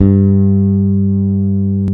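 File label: Bass Electric Full G3; CMI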